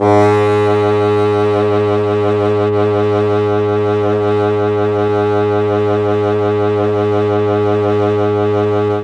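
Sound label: G#1 Tenor Sax, Mellotron; CHMB TNR SAX; Mike Pinder - Mellotron Archive CD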